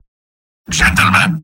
battle cry; spy; mvm

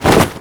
wingflaps light; bri bri bicus

